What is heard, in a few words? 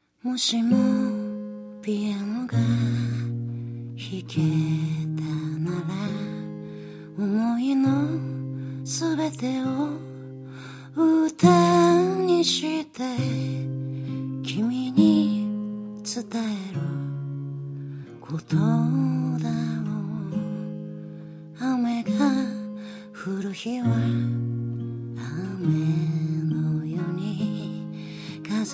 Piano